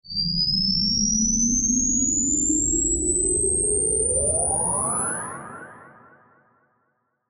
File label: Sci Fi Spaceship; Spaceship Power Up Warp; Spacecraft